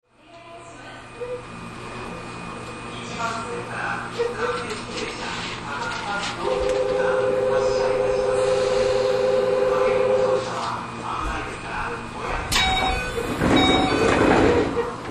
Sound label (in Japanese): 8000系 笹塚→代田橋, 走行音, インバーター制御で西武線6000系と同じ音がします; Keio